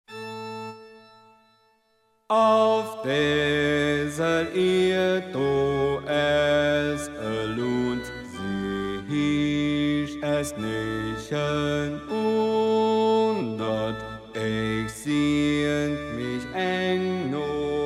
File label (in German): Bass, chor